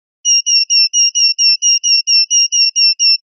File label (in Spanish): titeos